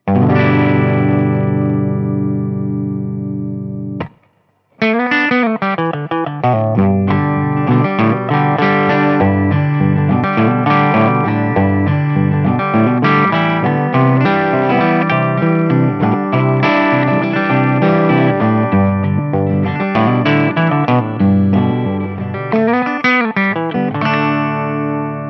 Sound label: Crazy country